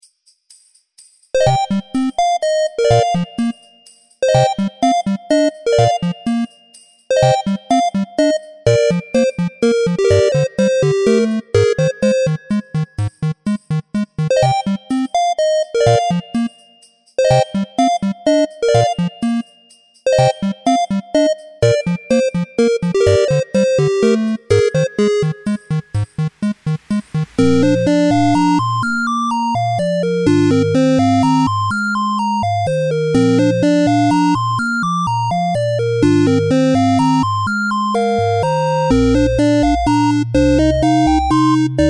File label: Snowy Cottage, 8-bit; 8-bit pack 2